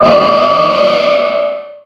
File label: pokemon cry